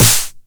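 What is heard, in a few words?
909 SD Tape, snare drum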